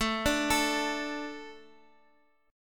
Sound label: D 5th A